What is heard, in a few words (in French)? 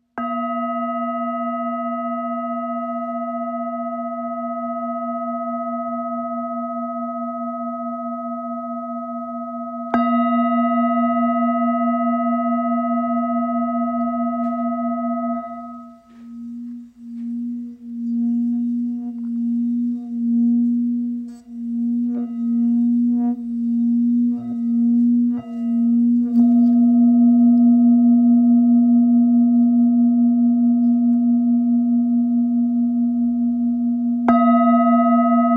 858gr 175mm 240htz si